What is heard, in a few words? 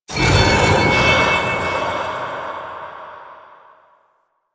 Sword Parry Stun; Warframe; Duviri; Dax; weapons